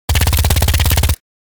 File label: Commando submachine gun short burst; gfxsounds